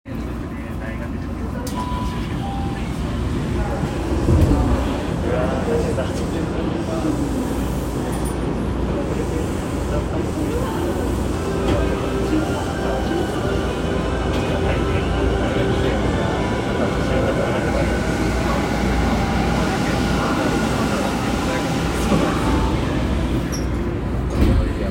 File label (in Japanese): 副都心線明治神宮前南, train sounds